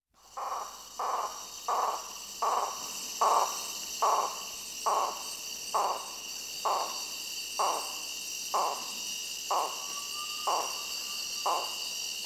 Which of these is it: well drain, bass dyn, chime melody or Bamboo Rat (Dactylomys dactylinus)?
Bamboo Rat (Dactylomys dactylinus)